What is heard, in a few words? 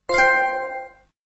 Ps4 achievement